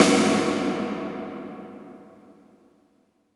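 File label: snare drum plate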